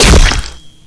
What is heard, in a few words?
axe cleave flesh; GoldSrc